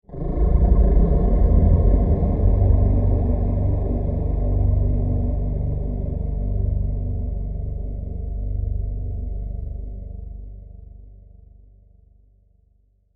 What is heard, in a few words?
Power Up, Power up drone.; Spacecrafts